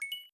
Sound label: chime; lollms-webui